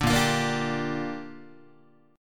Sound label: A sharp 6th, guitar chord; strummed; JGuitar